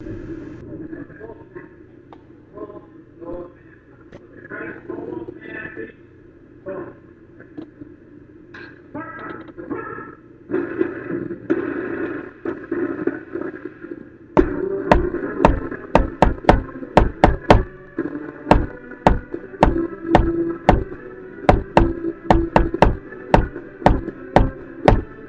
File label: beach bass drum